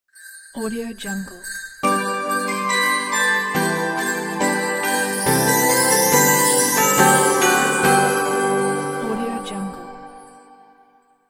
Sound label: deck the halls jingle logo